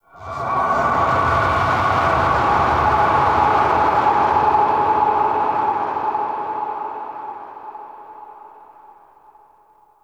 Sentient Vessel Delay Scream; Warframe; Tau

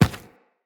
Infested Medium Run Default, Footsteps

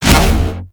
roulette jam burst; Cowgirl

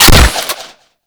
Hunting Bow Fire

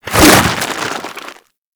Infested Ancient Arm Attack

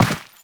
Step Dirt; Warframe; Teshin

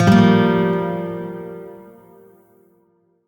Shawzin Min Chord J; Music; Warframe; Lotus Shawzin

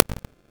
Prism Cast; Warframe; Warframes; Harlequin

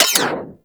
Tiberon Prime Mech A, Weapons; Warframe; Tenno; Tenno Drake Rifle

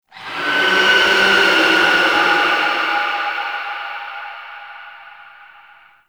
Pain Verb; Enemies; Warframe; Tau; Sentient Dactolyst

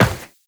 Run Metal, Footsteps; Warframe; Tenno

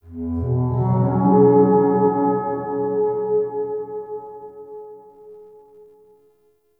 Cascading Fifths Flower Structure; Tau; Flower Farm

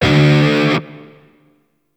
Shawzin Oct One D; Warframe; Grineer Shawzin

